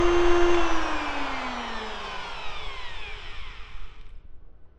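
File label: circular saw off; ragemap2023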